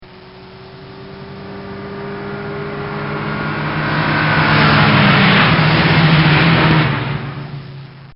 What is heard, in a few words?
Volkswagen Drive-By; Transportation; Bobby Cole